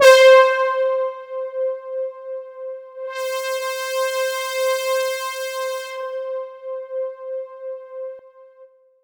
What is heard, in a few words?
C4, JUNGLE BRASS; Roland JV 2080